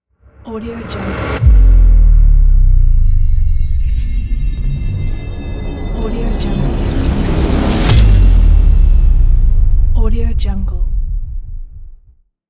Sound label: trailer cinematic impact